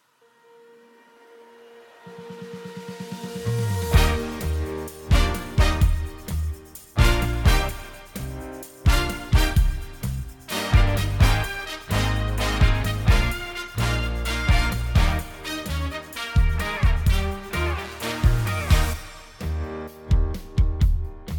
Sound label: Instrumental